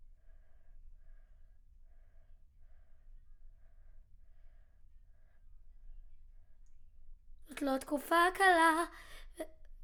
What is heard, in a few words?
Children Choir; Studio Bekol Children Choir